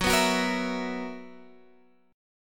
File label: B Major 7th Suspended 4th F sharp